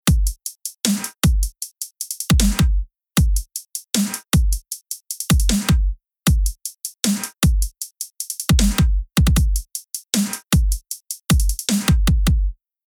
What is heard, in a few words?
Future Bass Rhythm BD